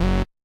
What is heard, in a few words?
saw, programmable speaker